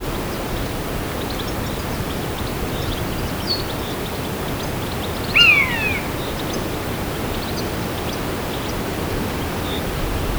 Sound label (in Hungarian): szeles időben faágon ücsörgő egerészölyv; Poroszló; termeszetben